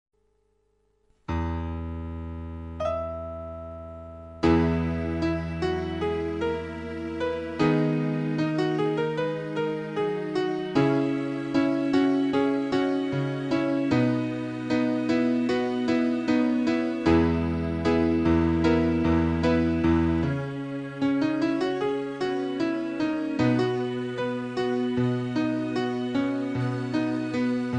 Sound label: Backing track